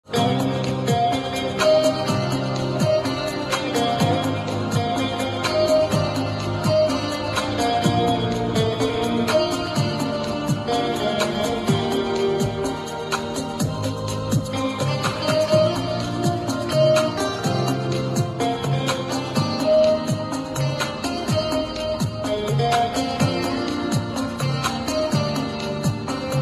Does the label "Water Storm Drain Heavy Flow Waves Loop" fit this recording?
No